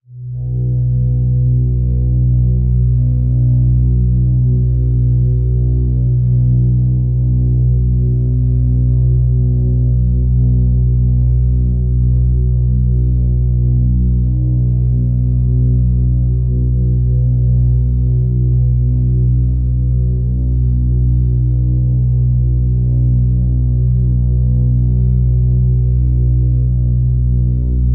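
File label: drone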